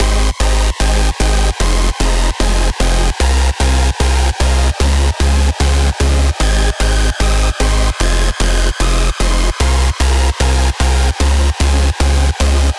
Supersaw Loop 150 BPM G# Min, Dubstep, Chord Loops; Synths; Cymatics; Dubstep Starter Pack